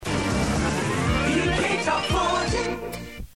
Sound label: top40 jingle; bbcradio1